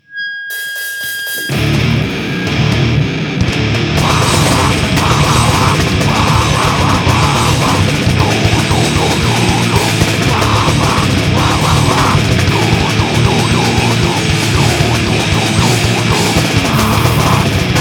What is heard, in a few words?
wah wah